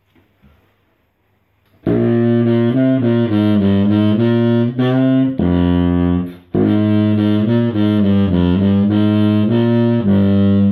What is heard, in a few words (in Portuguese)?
sax contrabaixo com si natural grave